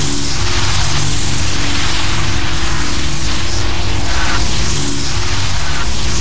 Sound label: loop; Vi